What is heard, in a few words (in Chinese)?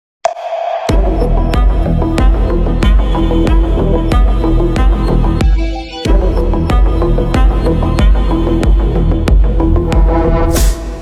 小米gt音乐